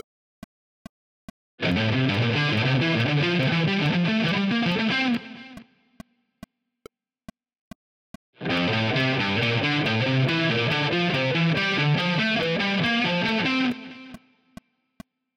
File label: triad arpeggios, guitar